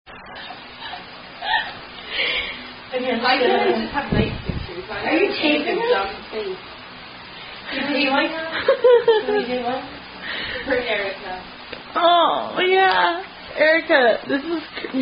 Children's Hand-Clapping Games, Hand Clapping Rhyme; Profanity In Children's Hand-Clapping Games